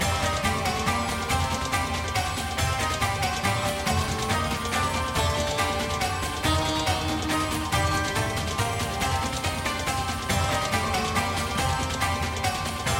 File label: music; Fantasma; medallion